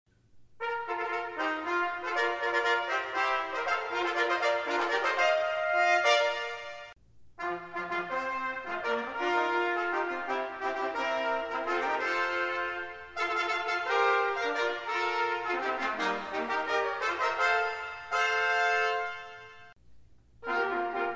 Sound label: Fanfares